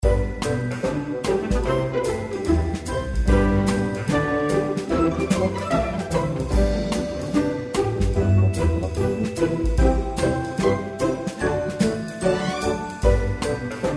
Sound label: Background; Candy Bona